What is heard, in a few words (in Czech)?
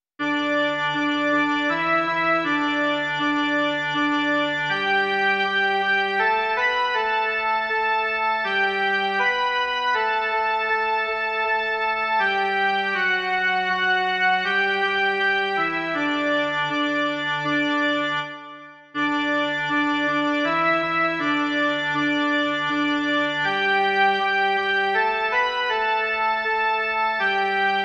jen melodie; Jest noc